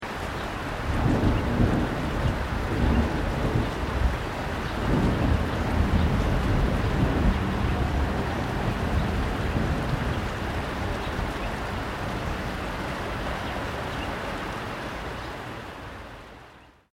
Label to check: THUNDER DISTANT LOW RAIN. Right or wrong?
Right